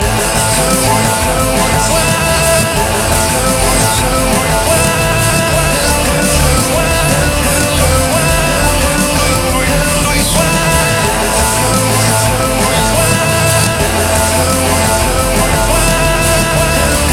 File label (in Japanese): ロック; EDMなし